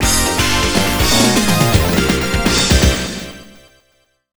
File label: Funky Dude Stage Clear; Armed Police Batrider; Raizing; arcade